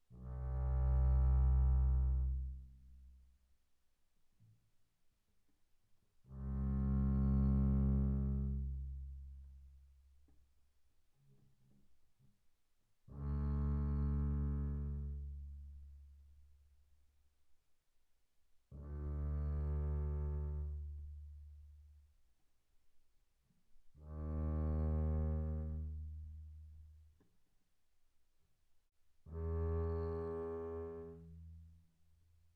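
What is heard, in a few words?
Bass arco sul A pianissimo C2 Bb2, double bass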